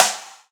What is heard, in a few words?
Acoustic Drums - Perc; Heavy Acoustic Drums; Antidote Advent